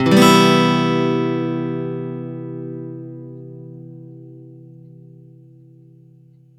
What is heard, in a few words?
One Shot C downstroke, Acoustic Guitar; Sonic Foundry (Sony Creative Software); Songwriter Acoustic Guitar Companion